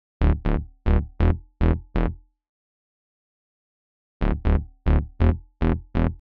simple bass converted

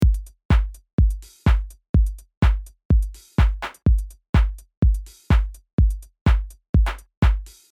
Drum Bus No Comp

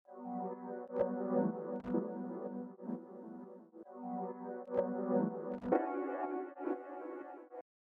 Pad; With Brauer

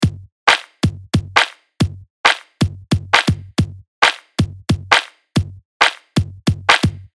Kick Snare

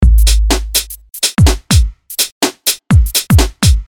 BD Modern Garage

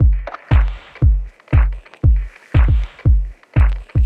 Texture Loop

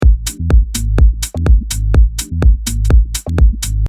with new drums

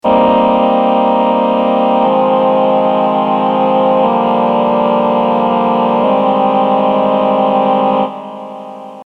V+ Chord Example

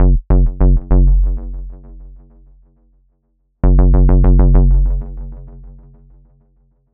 Envelopes and Hive effects

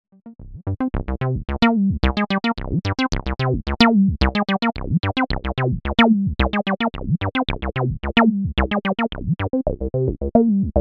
Filter Sweeps

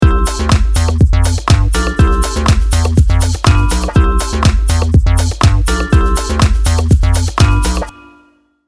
4 Bars Ionian

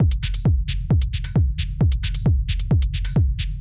Dark Room Techno

